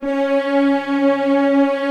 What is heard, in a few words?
Orch Hit, C#3; Sprints